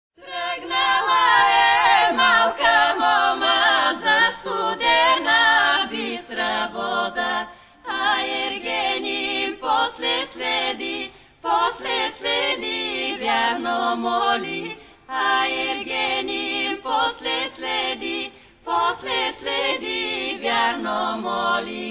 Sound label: bulgarian voices, live trio